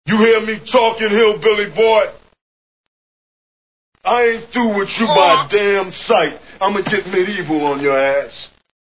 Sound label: midieval